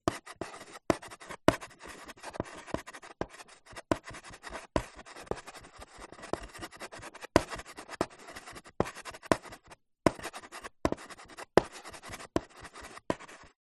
office chalk stick thick write fast on small chalk board